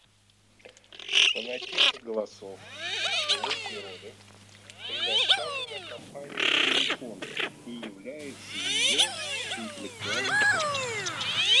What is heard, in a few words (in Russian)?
Красноголовый нырок Moriglione